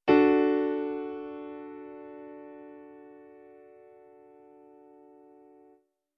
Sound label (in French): majeur